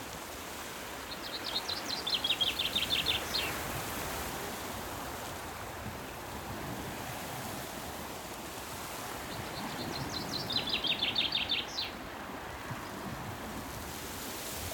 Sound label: Rural summer with birdsong, distant sea waves, breeze; Sound Ex Machina; Mediterranean Summertime